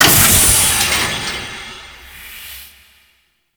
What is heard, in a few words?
Forest Storage Drum Start; Props; Warframe; Grineer Forest